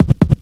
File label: Loops Fills; Vengeance Samples